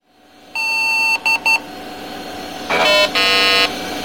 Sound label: pip